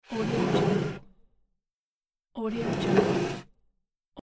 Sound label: drawer; soonus